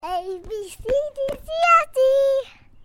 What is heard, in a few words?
kid sms